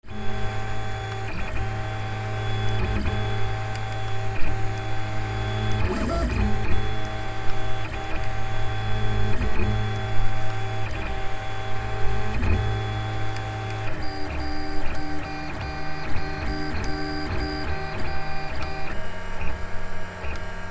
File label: 3D printer printing a sequence